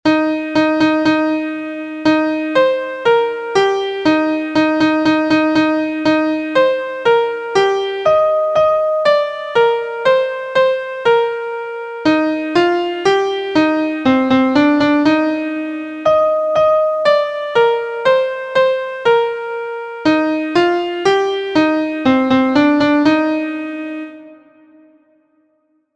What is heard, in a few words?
MIDI